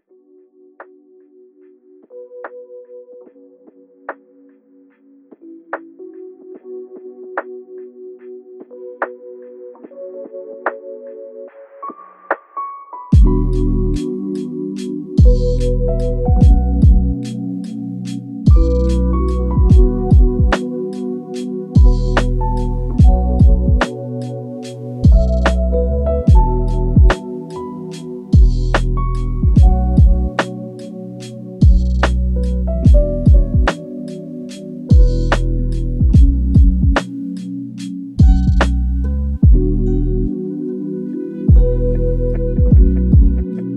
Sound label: lofi hip hop